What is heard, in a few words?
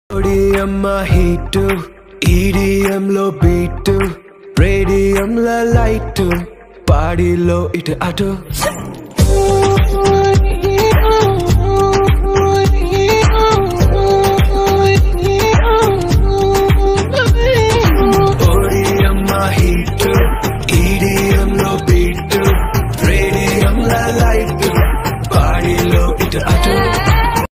male version telugu ringtone